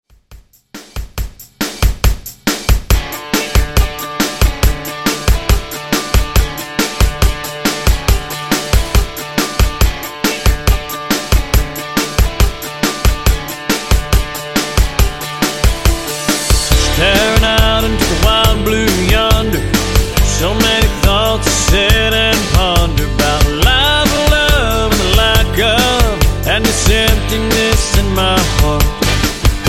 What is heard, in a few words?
Ext Redrum 139Bpm